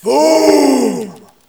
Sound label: Foom